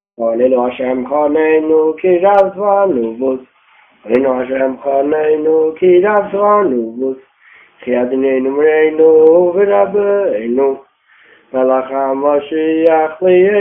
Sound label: singing nigun; yud alef nisan